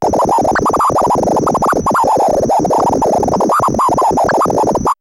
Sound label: BUBBLES, SYNTH FX; AMG - Vince Clarke Lucky Bastard